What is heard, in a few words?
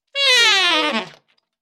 Sax Run Descend